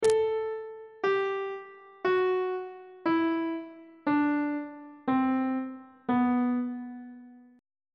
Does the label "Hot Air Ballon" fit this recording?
No